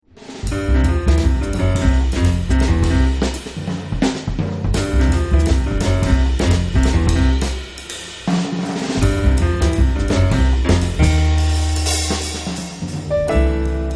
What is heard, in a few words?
samba jazz trio